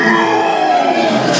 death; quake2; makuta

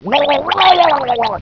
drown; quake2; orc

